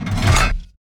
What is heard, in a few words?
gun reload; World of Tanks